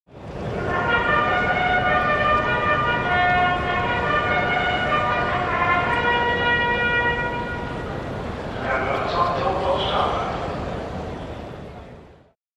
Horse Race with bugle sound

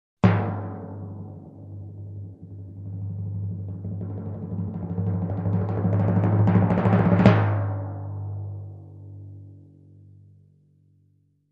Long Timpani Crescendo

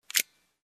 Construction

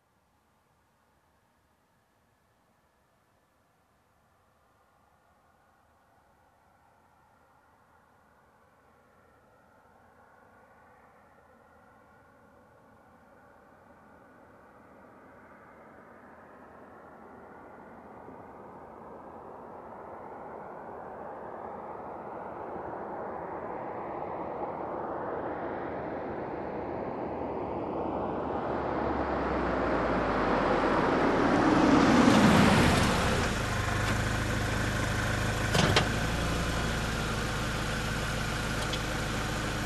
Car approaching fast and parking

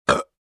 Burping

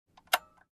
Aircraft Overhead Bin lock click sound

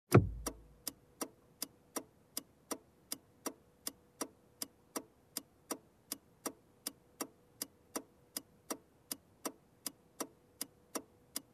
Car blinker in cockpit

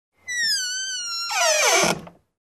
Creaky Door close

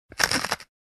Pepper Mill

